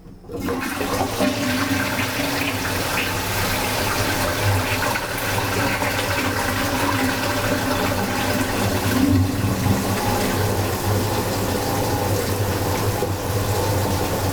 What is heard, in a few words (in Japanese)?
toire; トイレ流す; トイレ水流し(立体)